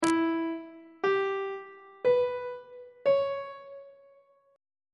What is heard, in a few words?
f flat minor 6th chord intervals; on treble clef